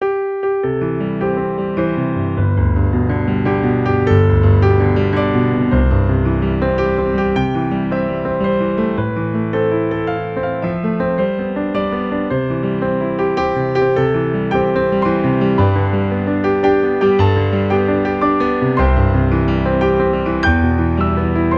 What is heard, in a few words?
Happy Birthday on Piano